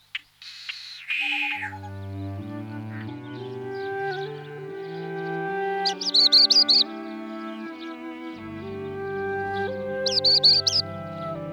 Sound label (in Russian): chernaya kamenka